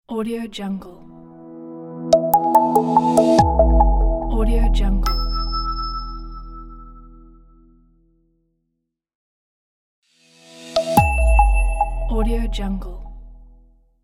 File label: minimal clean logo; abcdmusic